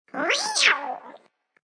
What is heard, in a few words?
Cat Reaaarrr, Human interpretation of a cat sound, pretty authentic sounding; great for animation; Animals; Cat Reeaarrr!